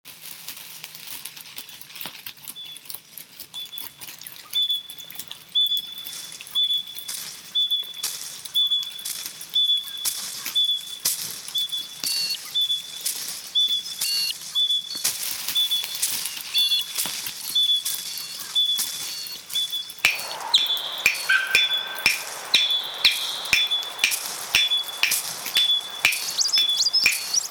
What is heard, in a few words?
Small bird soundscape